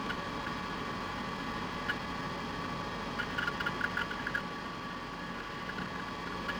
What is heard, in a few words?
mainframe loop; Soldier Of Fortune II Double Helix; XBOX; ambience; nyc